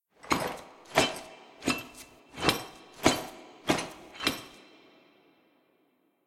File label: walk normal